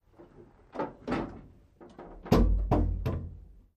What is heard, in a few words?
M60 Tank Int Hatch